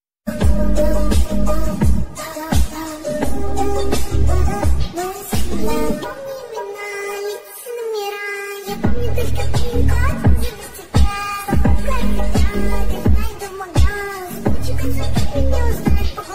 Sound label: mummy miral song ringtone english ringtone